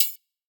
Ride Shot

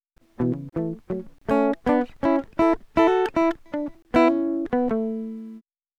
Acoustic Guitar 80bpm A Jazz Folk

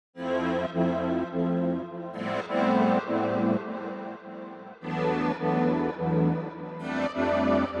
Chords; Lumino

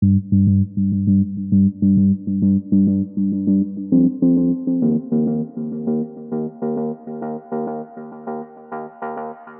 100 G m Building Bass

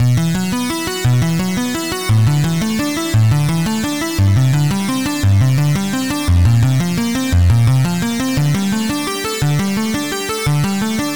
Synth Retro Arp 172 Dm

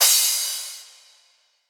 Crash

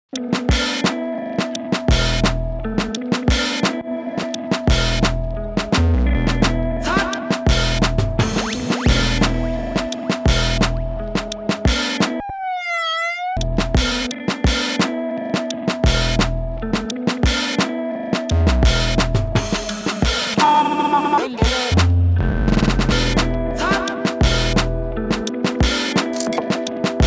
Drums; From The Bungalow Vol 3

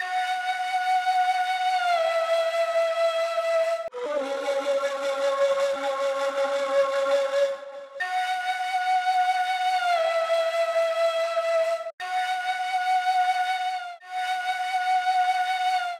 Musical Loops 120 F min

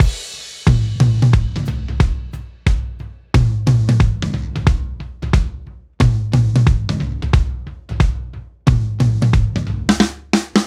Drum 90bpm

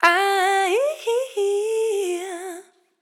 vocal riff seventh F

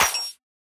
Clap

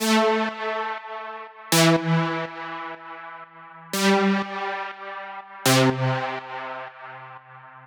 Mid Lead 122 G

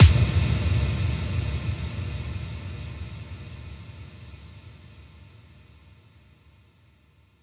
Impact; Evolution Of Sound

